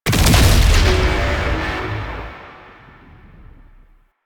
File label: Fuel Projectile Explosion Super; Warframe; Jade Shadows; Ascension